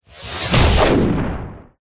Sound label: sound design hit scratchy lead into a crunch punch; zapsplat